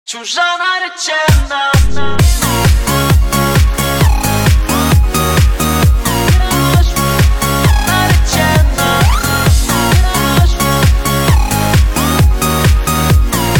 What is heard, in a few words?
radio remix